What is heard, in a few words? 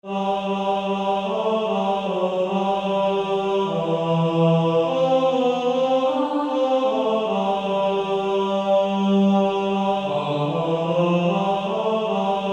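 Male Choir, tenor 2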